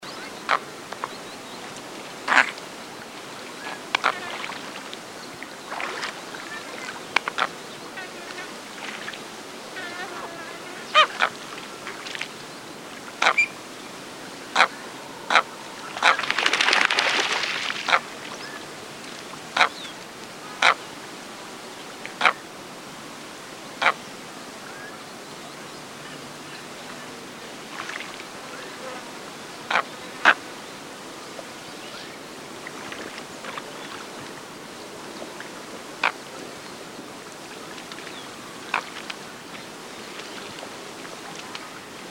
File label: Andean coot